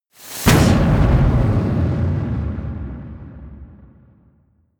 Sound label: missile small fire stationary close